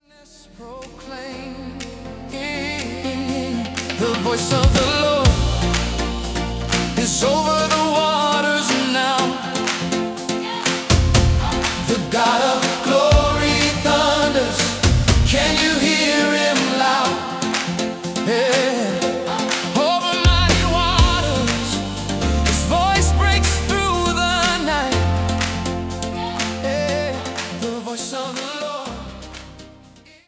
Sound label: Voice of the Lord Pop Style; Psalm 29; latin pop style